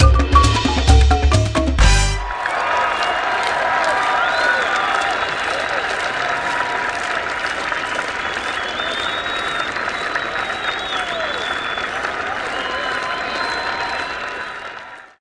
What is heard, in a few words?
New Power; madagascar; ps2